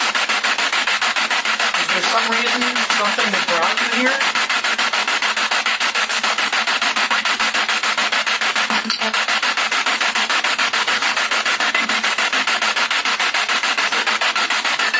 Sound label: Kitchen Spirit Box